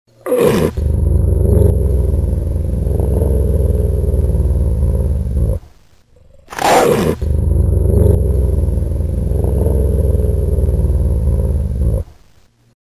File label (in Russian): ryk